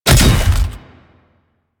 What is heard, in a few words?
Ghoul Devourer Body Impact; Warframe; Grineer Ghouls